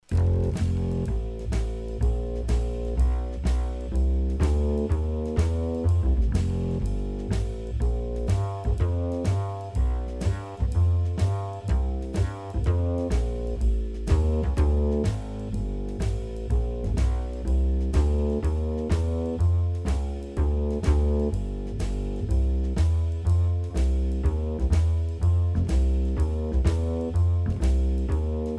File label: F blues; bass and drums; Ear Training